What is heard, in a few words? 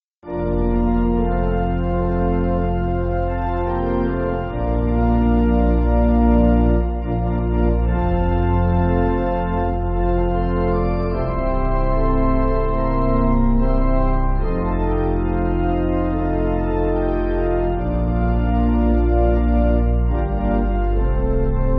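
Pipe Organ, Free Music Backing Files for Churches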